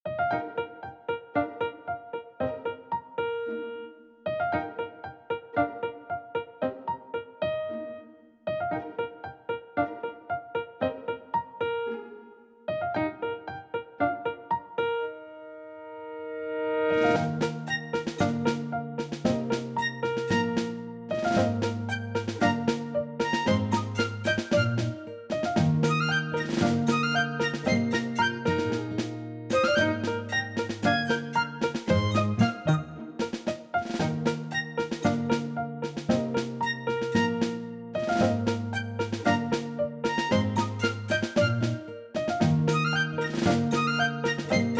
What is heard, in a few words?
brewing music